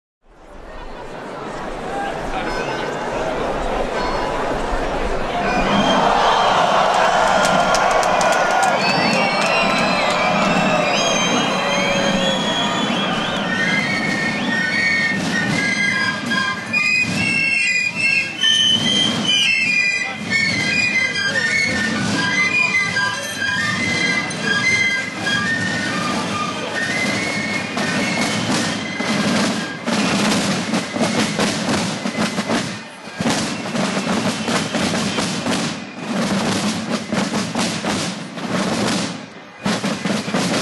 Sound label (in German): Fasnacht